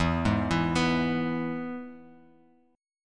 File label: E Major, down Guitar Open G